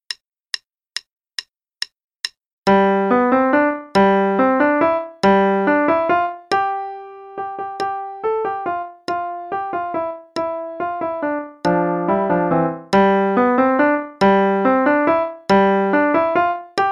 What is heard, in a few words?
Waltz (st part); Faber Adult Accerlated; Adult Accel Level 1